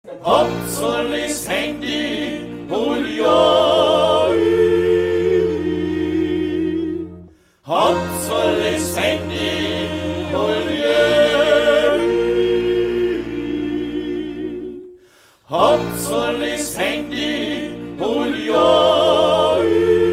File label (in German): handyjodler